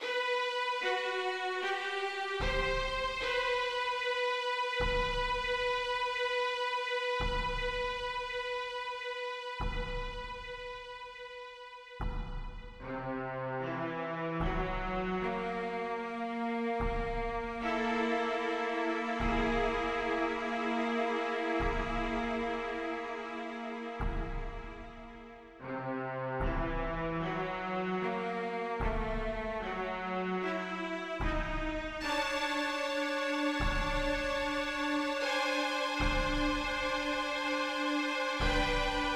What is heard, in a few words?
horror; FF7DEMO